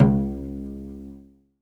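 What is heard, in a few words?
SOLO CELLO, Synclavier Strings; ILIO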